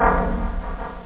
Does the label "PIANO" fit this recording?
Yes